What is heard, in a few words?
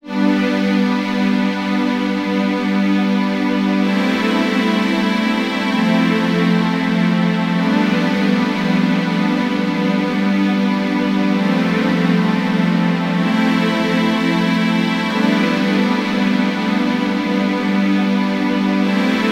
strings